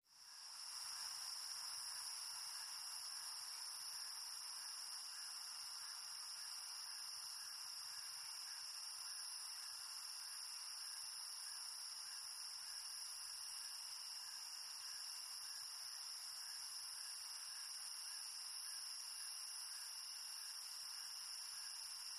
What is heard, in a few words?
Nighttime Early Wint, cicadas